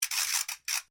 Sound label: NOISEMAKER WOODEN SPIN SHORT, Cheap percussion toys